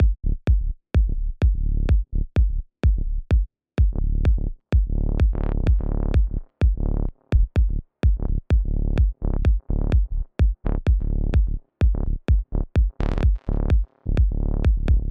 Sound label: KICK BASS